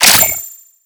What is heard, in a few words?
CTF Pickup Flag; Warframe; Ambience; PVP